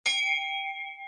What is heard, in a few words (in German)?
dunne glocke